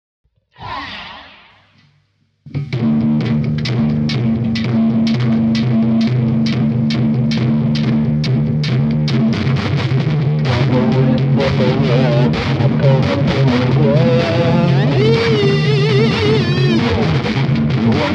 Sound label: Something Upbeat for the Kids